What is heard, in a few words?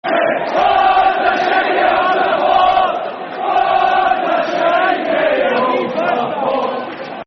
Shite Home Support, Football Chants; Rangers GRFC